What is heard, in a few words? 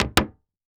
Foley Footstep Cowboy Boots Jump On Hollow Wood Surface; Foley Footsteps